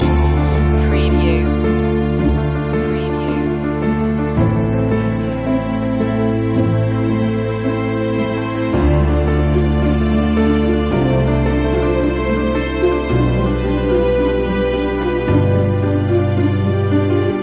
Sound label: river of reflections loop